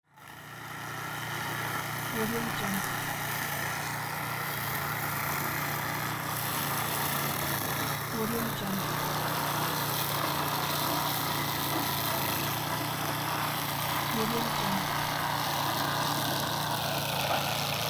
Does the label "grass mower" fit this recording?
Yes